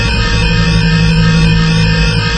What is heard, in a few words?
reactor loop